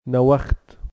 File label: nawakht